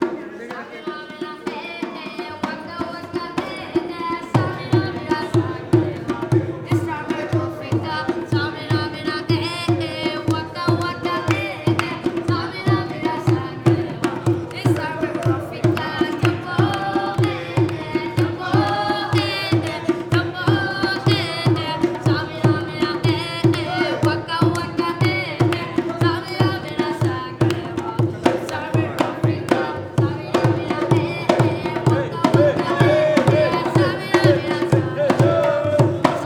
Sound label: Little Boy Plays Drums and Sings at Amer Fort Jaipur; Samuel Corwin; Selected Field Recordings from India and Nepal Volume I Folk Songs